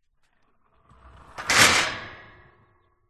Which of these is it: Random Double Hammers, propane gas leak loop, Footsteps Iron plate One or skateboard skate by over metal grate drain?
skateboard skate by over metal grate drain